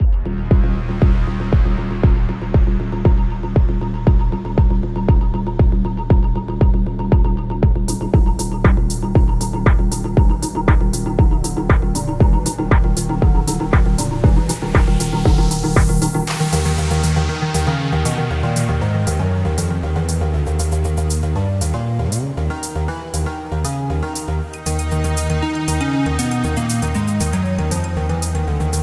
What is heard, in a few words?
Techno